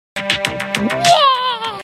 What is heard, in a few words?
waaaahhh